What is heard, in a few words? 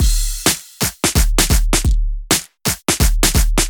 clap alt crash, edm drum